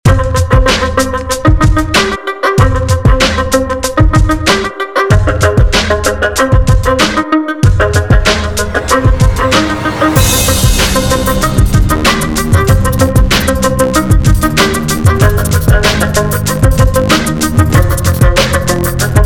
Gangsta Viola

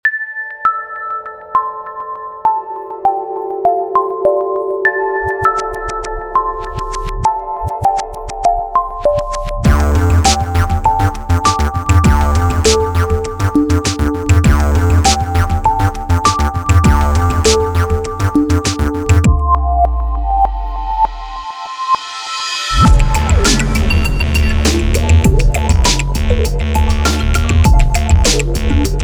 Electro Swamp

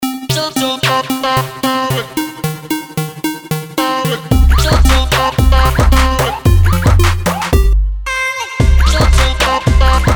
Moombahtone

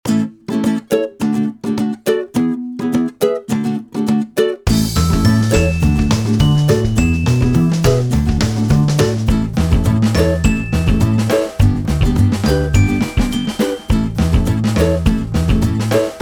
Blowing Bubbles

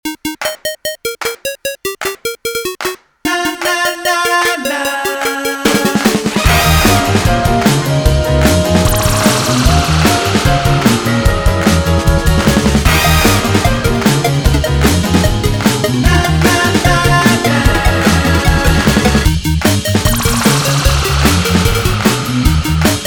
8 Bit

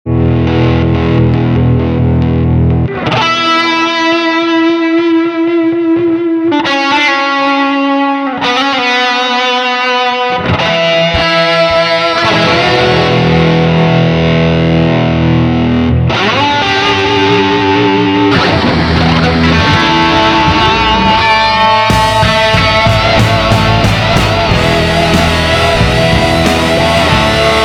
Guitar

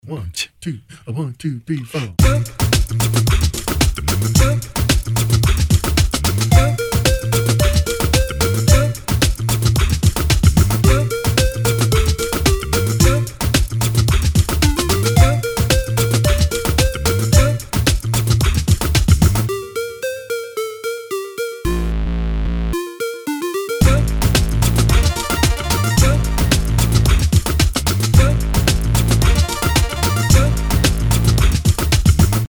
Doo Wop Ditty

